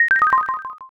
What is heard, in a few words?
score down